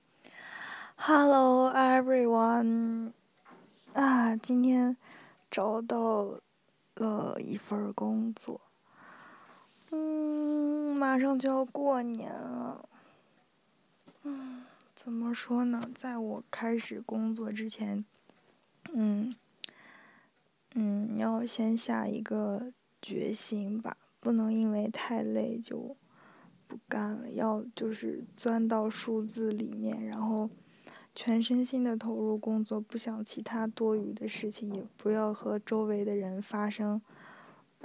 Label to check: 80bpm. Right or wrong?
Wrong